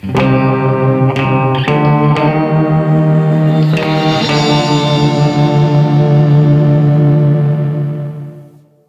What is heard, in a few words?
Lose; Super Macho Man; Punch-Out; Wii